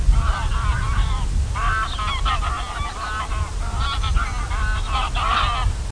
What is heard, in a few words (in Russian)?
serij gus anser anser